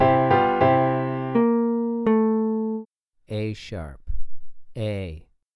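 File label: Guitar 2 Note Melody Major a#2 a2; melodic ear training; melodic ear training for musicians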